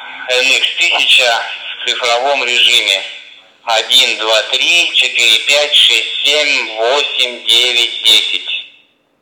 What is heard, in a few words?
nx-1000 tx dmr; KENWOOD; цифровом режиме DMR; Пример модуляции (передачи) радиостанции NX-1200, NX-1300 в цифровом режиме DMR